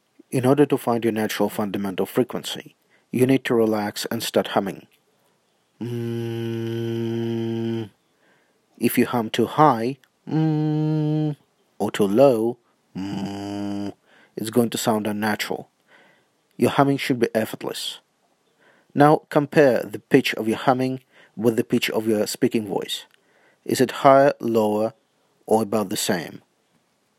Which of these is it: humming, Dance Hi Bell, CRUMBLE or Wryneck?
humming